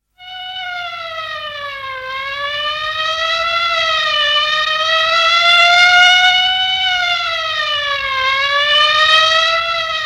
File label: siren